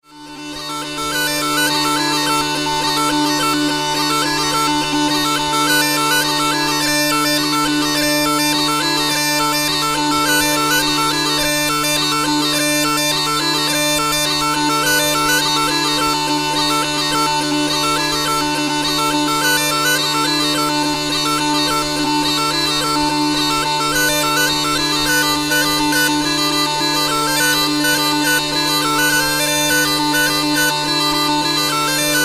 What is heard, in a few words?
smallpipe d